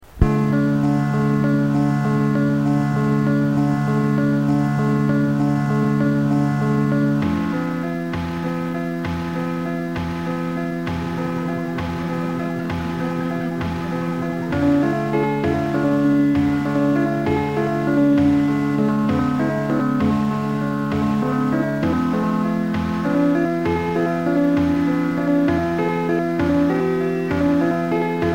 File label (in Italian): Studio Per Pianoforte Sintetizzato